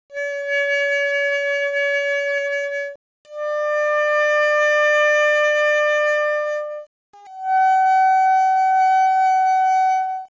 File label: saxophone; Spectrum Interpolation Synthesis for the Compression of Musical Signals